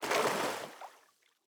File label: footstep water hard; Rust